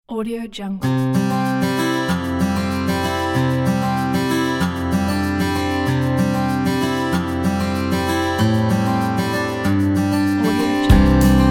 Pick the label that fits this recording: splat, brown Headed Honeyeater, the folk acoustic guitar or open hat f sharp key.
the folk acoustic guitar